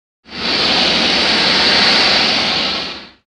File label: Jungle Aligator; gnarled forest